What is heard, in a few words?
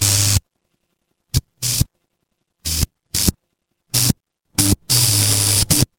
fire electric zap